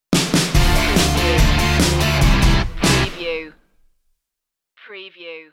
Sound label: teen kicks stinger